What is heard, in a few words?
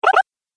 Jump Video Game; Twistedtracks